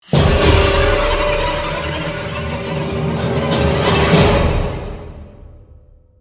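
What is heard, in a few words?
Music Stinger Snow Large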